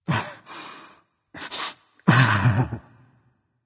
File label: boohoo berserker; cuchulainn